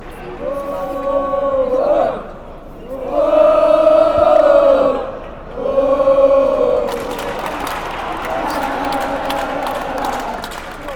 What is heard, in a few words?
woah nana claps